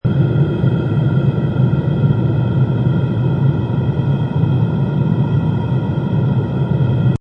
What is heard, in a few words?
Horr Breath Synth, Breathy synth horror loop at 135 bpm.; Synth Loops